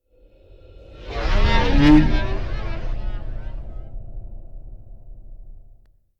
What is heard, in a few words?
Whoosh Twisted Vocal; bottlerocket